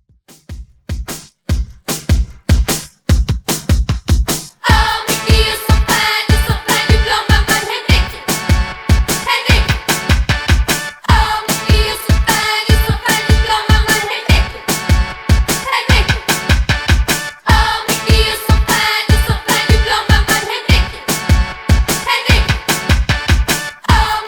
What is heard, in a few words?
150Bpm; Redrum